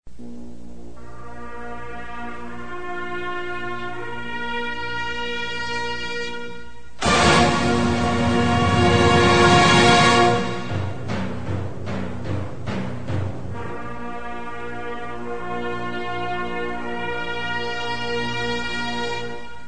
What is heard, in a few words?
Fanfare; Northwestern State